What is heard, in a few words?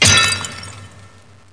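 break vase; thief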